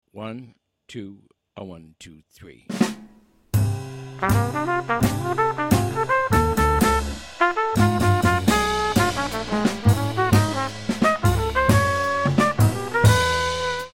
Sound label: SLOW minus piano